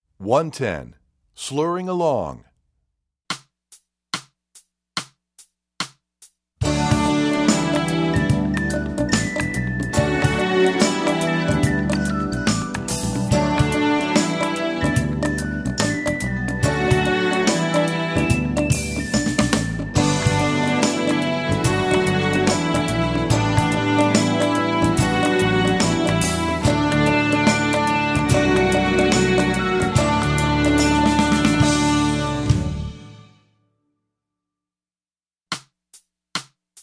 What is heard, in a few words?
Slurring Along, strings; EE2000 Book1